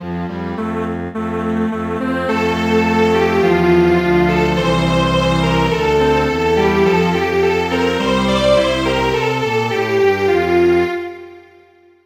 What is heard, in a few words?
Strings Quartet